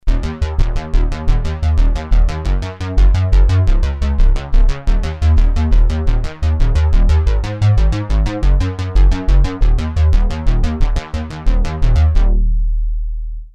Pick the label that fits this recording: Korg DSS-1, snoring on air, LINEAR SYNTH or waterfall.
Korg DSS-1